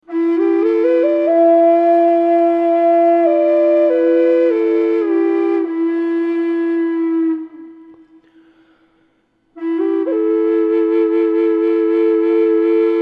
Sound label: E Drone, flutes